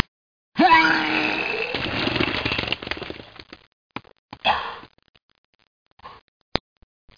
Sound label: puke